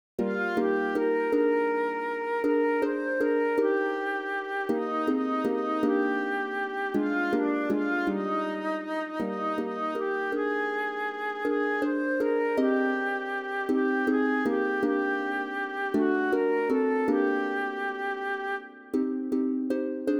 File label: Lullaby flute and harp; de-Jong